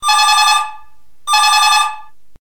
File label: phone